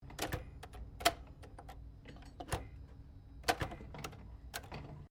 Typewriter Remington Standard Key Jam, Old typewriters; 1940S; Typing (Sound Effects:Offices)